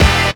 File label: HIT, Hits; Houseworx